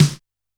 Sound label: Snare M, Standard Kit, Yamaha PSR-E323